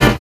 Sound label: aggro, hits; RoBKTA Sample Pack Supreme